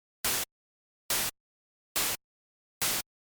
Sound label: snare